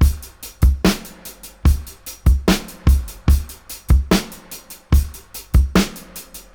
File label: 73, Dub, DRUM LOOPS 073 BPM; Drumdrops In Dub VOL-1